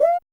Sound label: CUICA MUTE; Drums In Your FACE!